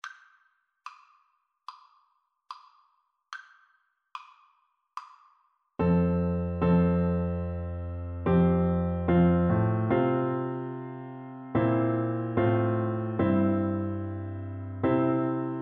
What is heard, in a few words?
Oboe